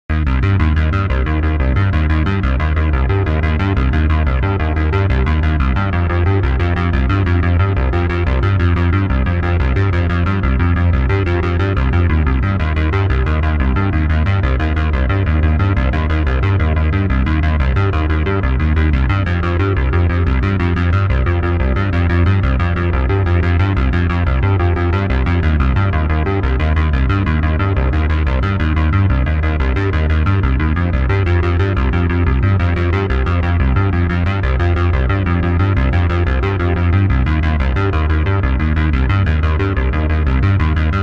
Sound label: Tronopulse Arp demo